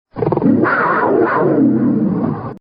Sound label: Cougar Growl